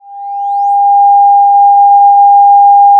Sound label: Sound of a police car siren